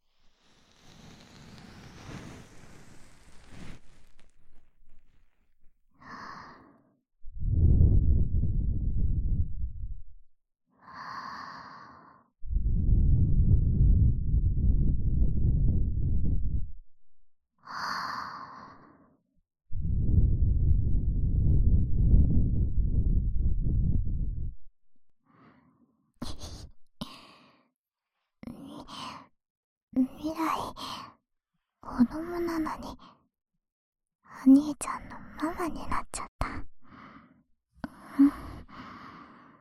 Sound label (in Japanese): 左耳かき, ASMR; 妹の友達のメスガキのママみ耳ほじりで俺は敗ける。